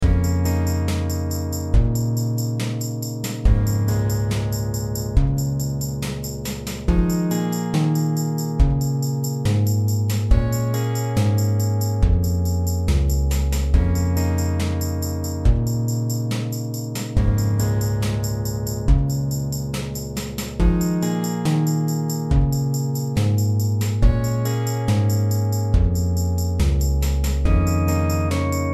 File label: background